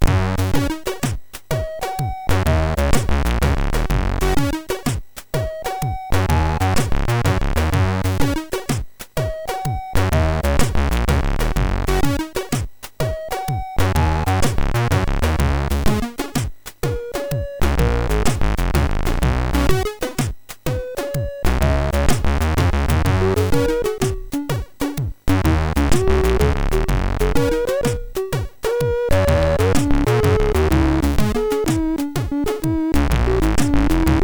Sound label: Reggae, sid MOS6581R3; hvsc